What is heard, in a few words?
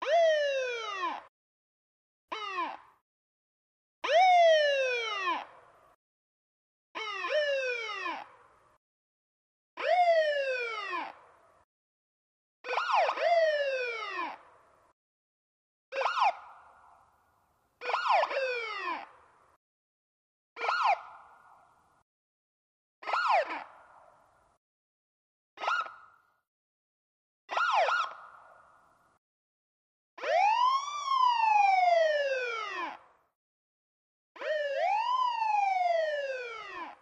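Ambulance Wailer Siren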